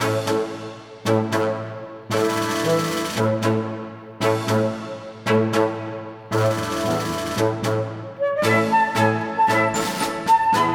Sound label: music menus main